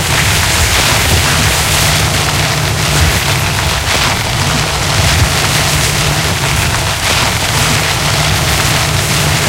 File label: tire grass int, tanks; engines; War Thunder